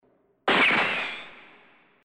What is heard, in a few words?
Gun